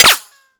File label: shield small